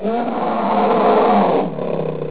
leeuw

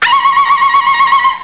indiaan

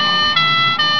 ambulance sirene

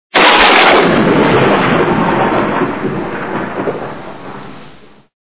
donder

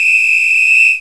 fluitje politie

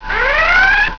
alarm sirene

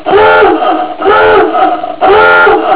duikboot

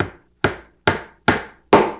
timmeren

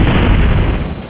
ontploffing

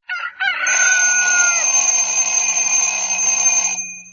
kraai en wekker

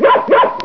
hond

